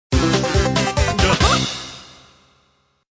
collect extra life

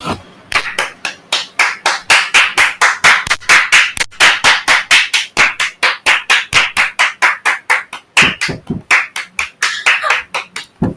clapping

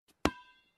dodgeball sound

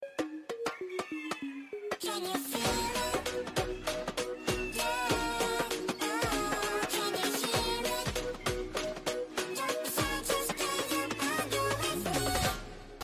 bongo cat

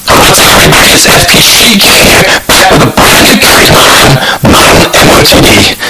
earrape

